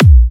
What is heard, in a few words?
A#, Kicks; markus hakala